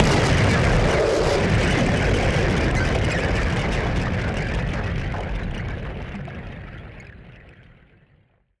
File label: Noise Bomb Harmon; Noise Bombs; musicradar; bomb factory